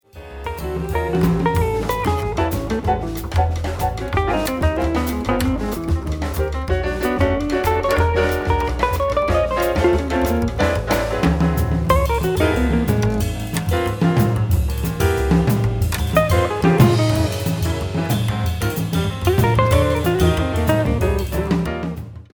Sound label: drums